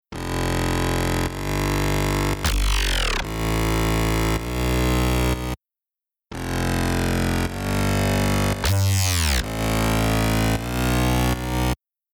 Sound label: Future Bass Bass